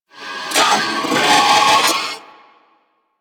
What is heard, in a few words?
Purgatory Warrior Possess Scream, Barks; Warframe